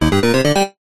multimedia game tone retro positive complete bright